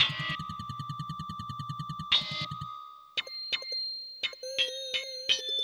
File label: Hip Hop and R&B Drumloops